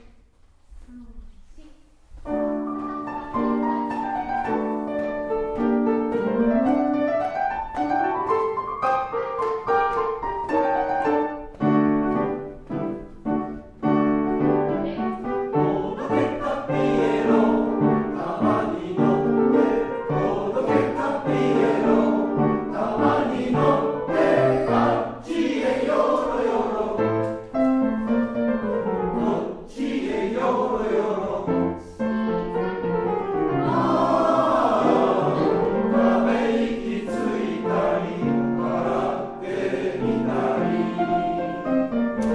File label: tamanouede with piano